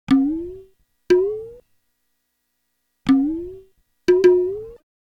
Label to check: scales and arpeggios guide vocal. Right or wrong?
Wrong